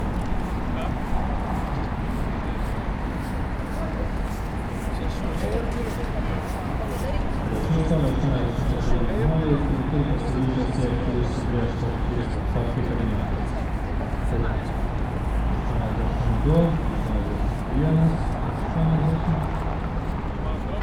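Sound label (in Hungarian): kettot uto toronyora katedralis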